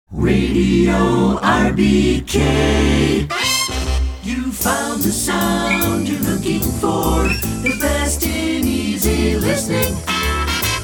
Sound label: Jingle; Short Attention Jingle Show IV